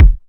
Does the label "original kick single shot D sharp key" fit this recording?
Yes